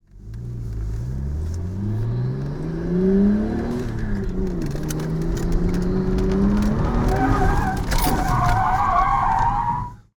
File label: transport car nissan skyline internal accellerate fast spin out skid; fork media